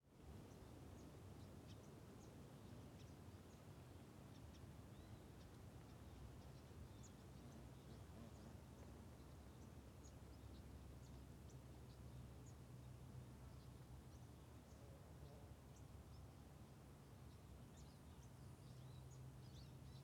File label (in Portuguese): Vento Suave em Campo Aberto de Capim com Moscas Passando Perto do microfon